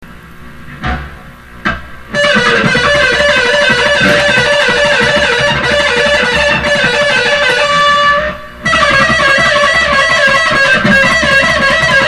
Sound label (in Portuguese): solo